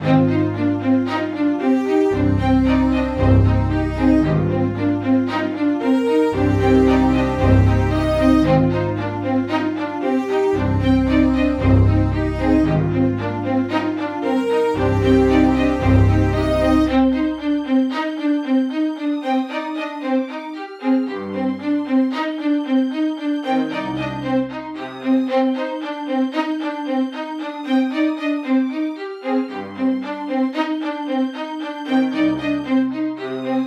Orchestral Strings Adventure, Cinematic Trailer Loops